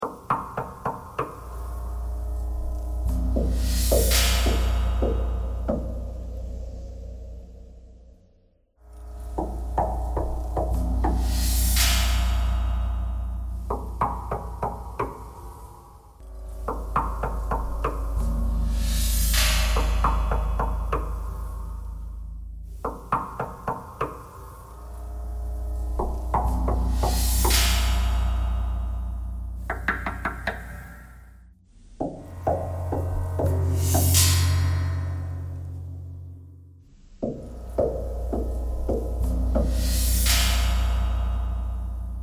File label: knocking thread noise